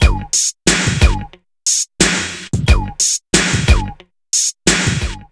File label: BPM 90; AKAI S-Series CD-ROM Sound Library VOL-4